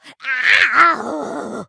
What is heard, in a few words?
stagger